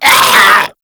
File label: Infested Runner Attack Call; Warframe; Runners